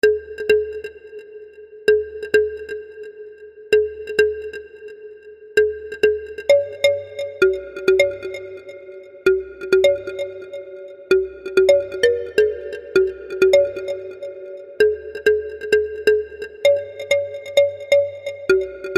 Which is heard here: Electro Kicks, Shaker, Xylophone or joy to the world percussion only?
Xylophone